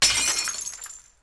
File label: Failsoft Alert, scanner